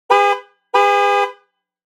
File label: Mini Cooper Sidewalk Double Honk; 2007 Mini-Cooper S; Erik Gendron